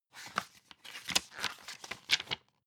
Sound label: magazine open